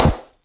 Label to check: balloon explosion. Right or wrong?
Right